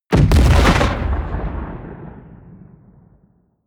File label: bomb fragmentation close